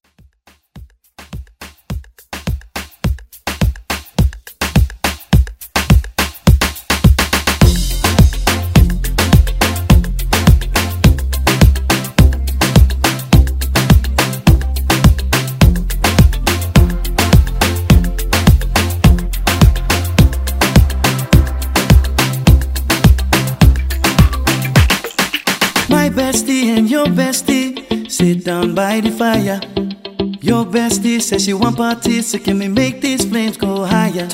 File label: Moombah Redrum